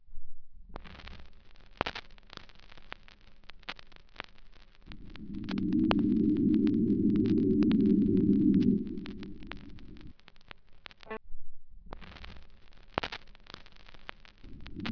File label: drone; env; zps